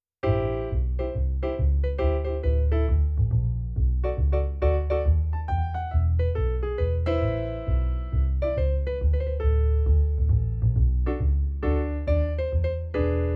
bass

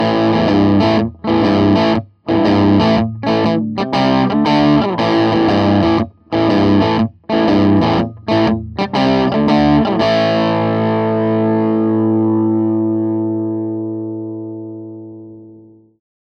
stratocaster crunch

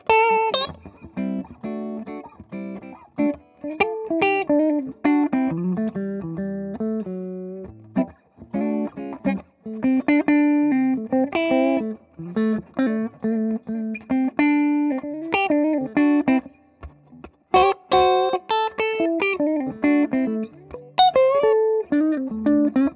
celestion g12m clean; laroqua 212 studio test

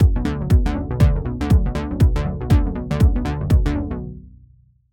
arpeggiator velocity swing

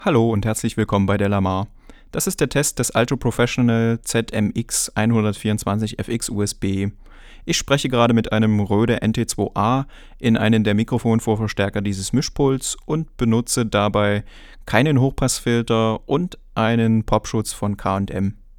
preamp; alto zephyr zmx124fx usb

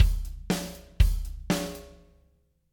ezdrummer 2 modern kit basic kit

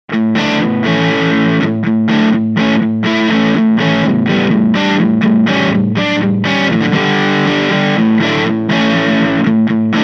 vox ac10c1 crunch rhythm

